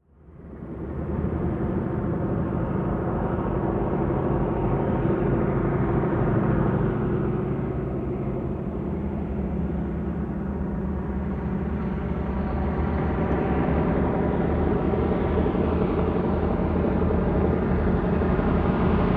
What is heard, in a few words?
propeller plane ju52 approach land short skid